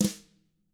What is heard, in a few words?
TAMA Drums; ILIO; Double Platinum Drums 1